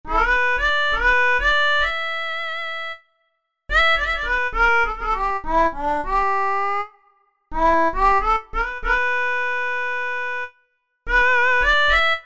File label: Harmonica, E-mu Proteus VX